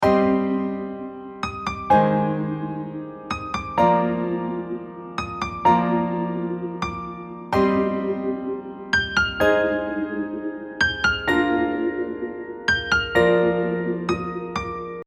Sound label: Build Harmony